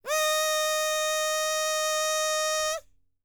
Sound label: HIMALAYAN, World Voices; ILIO; Vocal Planet VOL-5